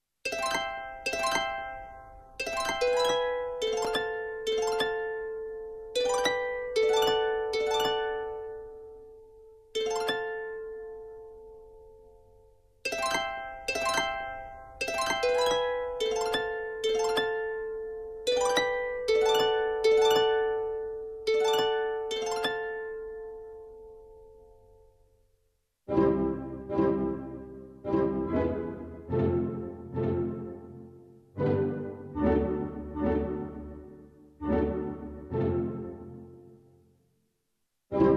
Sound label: short harp intermezzo